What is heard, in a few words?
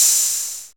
808 OHH